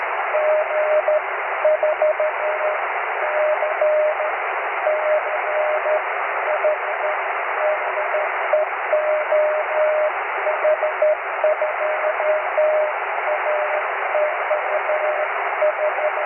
cw

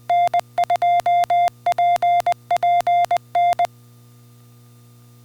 morse

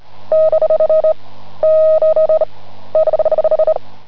80m cw

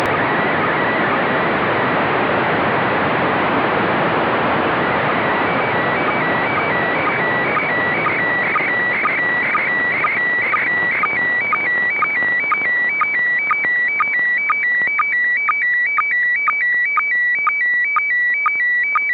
AUDIO SSTV, ISS

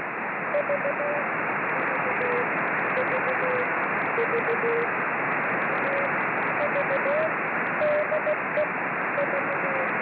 issbounce; ISS-Bounce Audio; Project Moonbounce

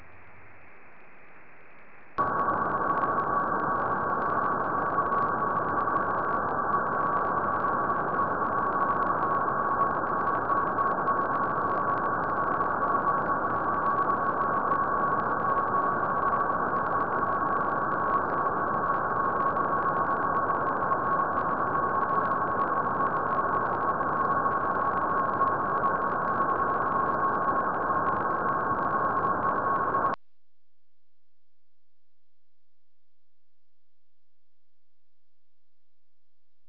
poltest 10 GHz -90deg; DK0SB; OK1KIR; OK1VAO

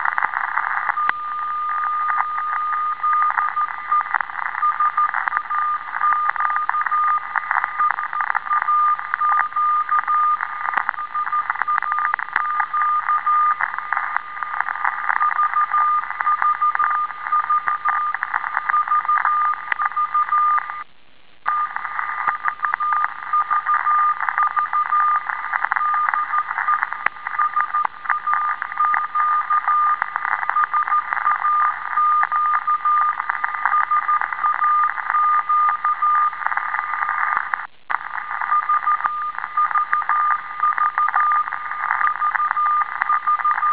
VLF 17200Hz

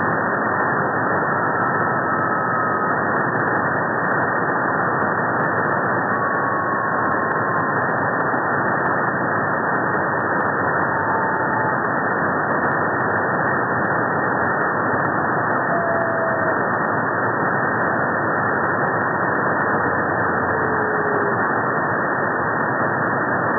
HDSDR 143050kHz AF, meteor; ISS

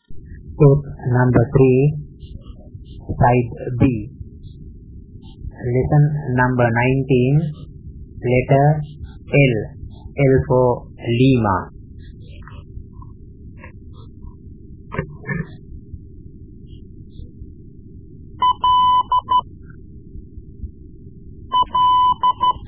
Morse Lessons

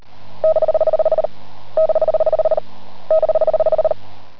80m cw

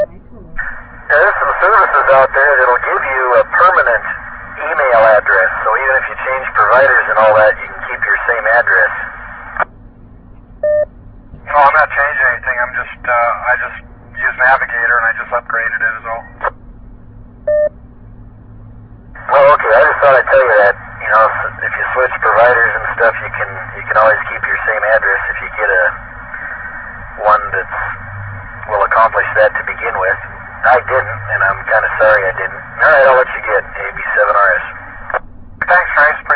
radio

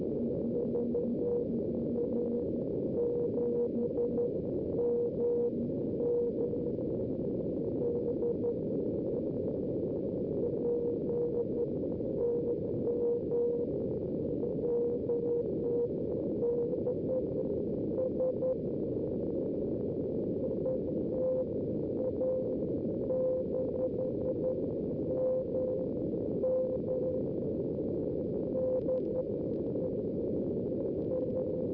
CW; Project Moonbounce

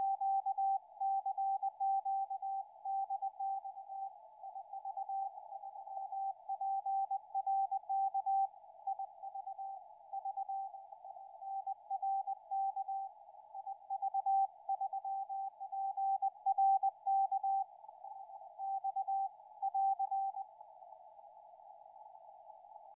160m, signals on 160m band; IV3PRK; RAØFF

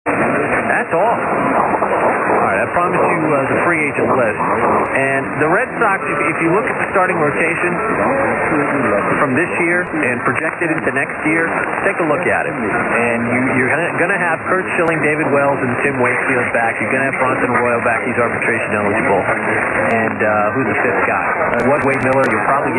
dx from uk; weei 850

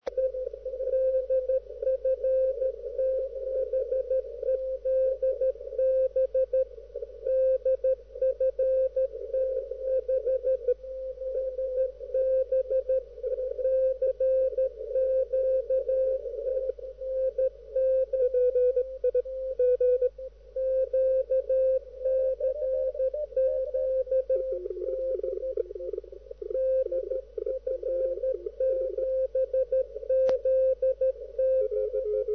jamming

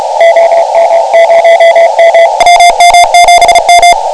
7MHz

